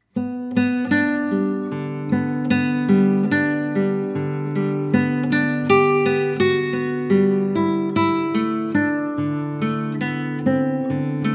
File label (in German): Wiegenlied; Johannes Brahms